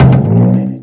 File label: Barrel Bounce